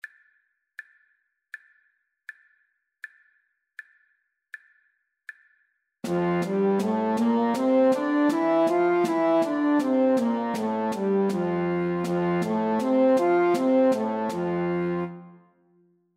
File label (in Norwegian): F-dur natura middels